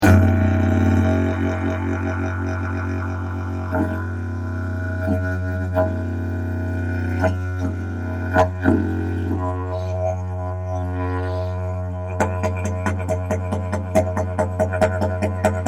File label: didge